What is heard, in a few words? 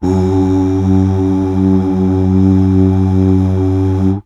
UUH